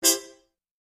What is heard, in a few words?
Music Effect; Various Trumpet Jazz Hits.